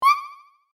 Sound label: mew; pink